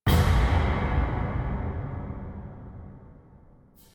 Tymp

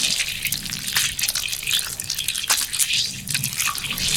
Ruins Eyeball Roll

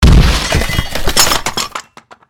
Break

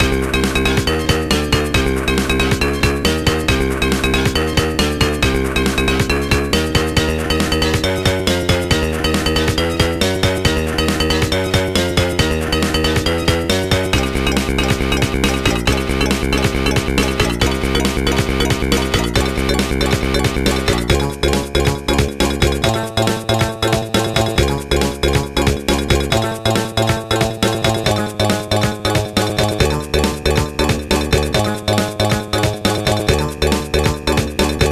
Jungle; action man robot atak

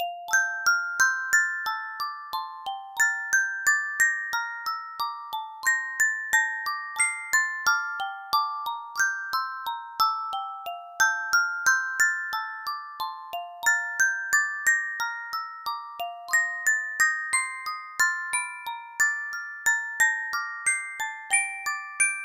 Music Box; Mii Maker

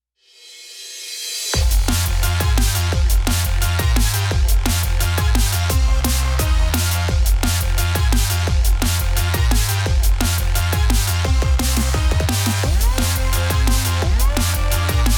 Heavy Dnb; Cyber Hook

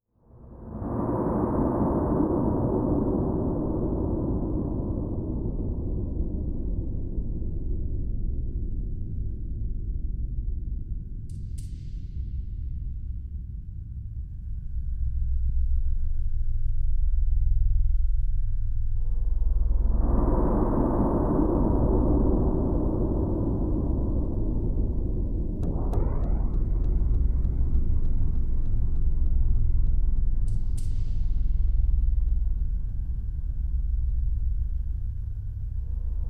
Underground Ambience; Dark Sun Wake of the Ravager; ms dos

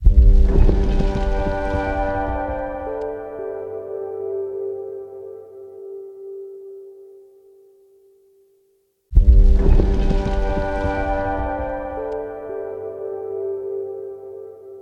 Jingles

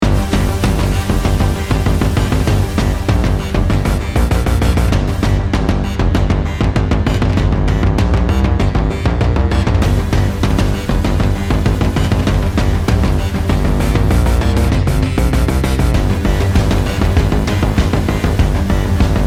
Bomb Planted Hostage Taken; Counter-Strike 2

